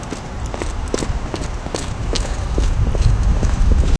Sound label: footsteps multiple people